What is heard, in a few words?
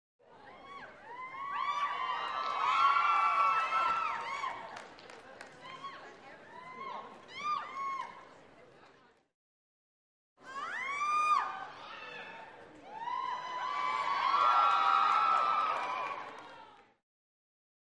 Crowd Murmur, large int